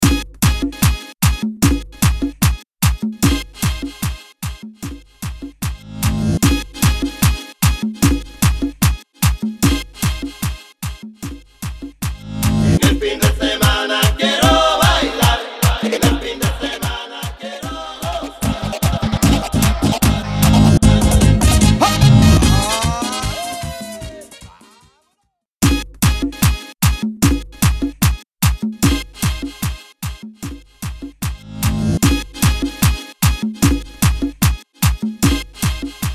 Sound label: Intro Outro Break 150BPM